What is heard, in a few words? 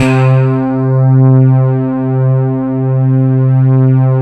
PAD DIG, Synth Pads; SYN-PADs; Synth-Pads 4